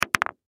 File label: Croquet Ball Drop, Croquet Ball Drop Bounce Cement; SPORTS; Blastwave